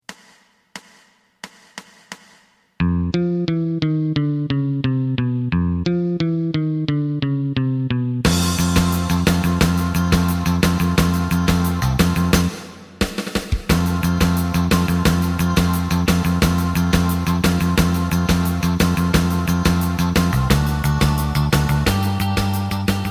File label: lead